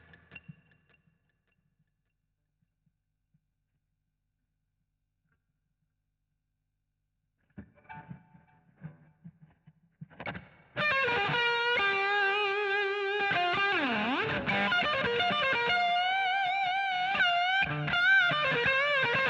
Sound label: GTR A Solo